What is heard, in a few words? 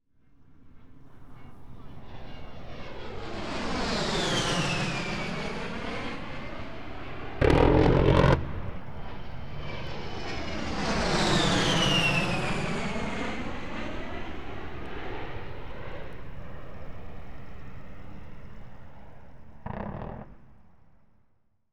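Warfare, Usaf Fairchild A10 Thunderbolt; BBC Sound Effects Library; Explosions Guns Alarms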